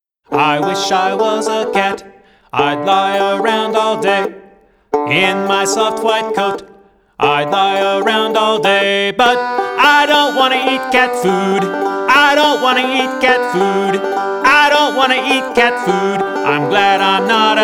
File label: banjo; cancion y banjo